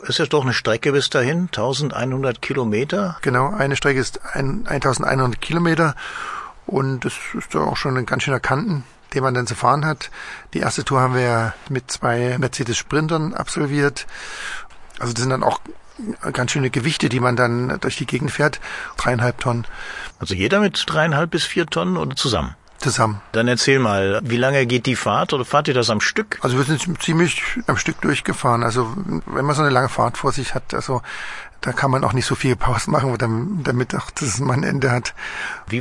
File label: classic brass